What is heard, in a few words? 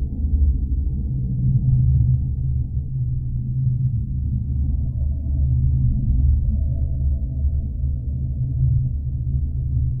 amb metro; cstrike; zm japan